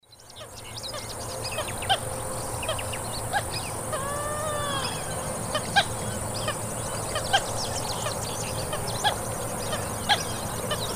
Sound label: Porphyrula martinica, calls; S. America; Eastern Lowlands